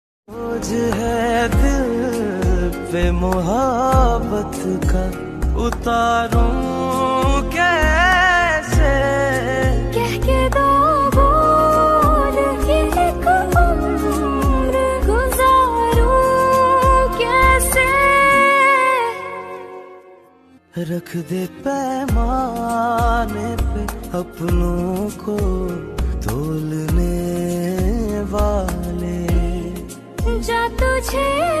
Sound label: Drama Song Ringtone; ringtones